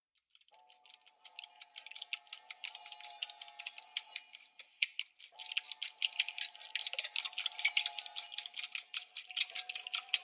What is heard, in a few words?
Horror